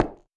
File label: High Heel